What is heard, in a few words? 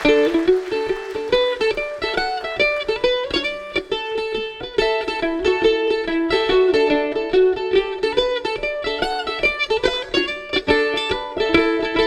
Acoustic Live